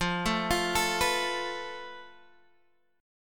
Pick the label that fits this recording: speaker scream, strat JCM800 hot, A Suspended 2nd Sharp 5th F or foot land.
A Suspended 2nd Sharp 5th F